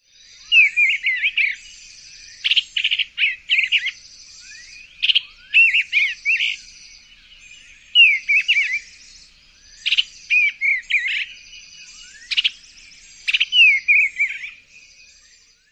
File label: dawn chit, bluebird; dawn chit1